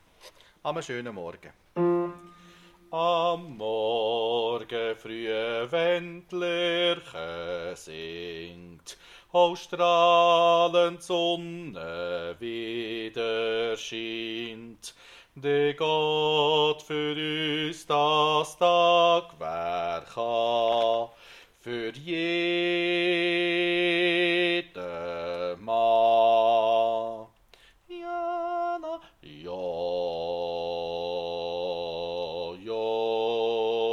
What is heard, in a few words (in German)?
Bass